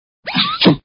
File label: Arrw Poof, cartoons